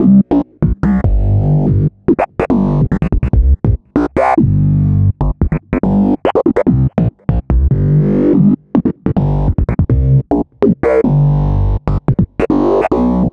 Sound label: Synth Bass